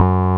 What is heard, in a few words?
Roxichord, E.Piano